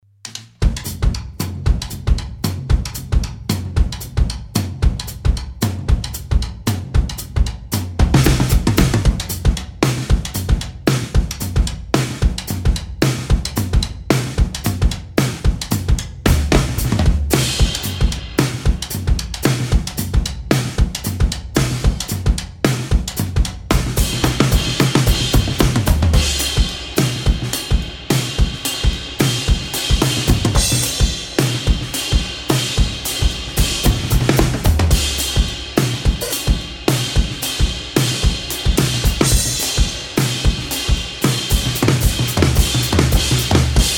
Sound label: big beat